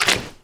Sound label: fs fm paint, footsteps; Portal 2